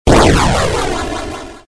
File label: die sound